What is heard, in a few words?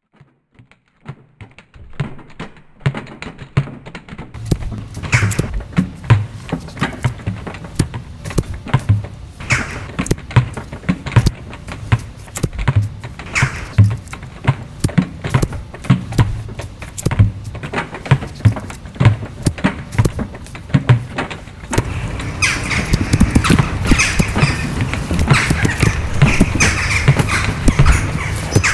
taps